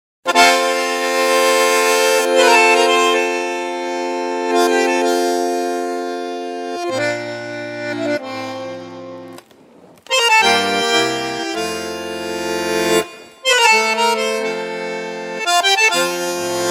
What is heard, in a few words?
Bandoneon solo